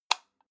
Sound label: light switch off